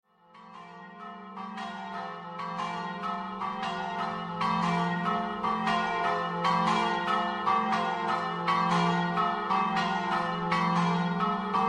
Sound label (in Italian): campane; GRUPIGNANO